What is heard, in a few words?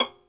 Synclavier Strings, SOLO VI; ILIO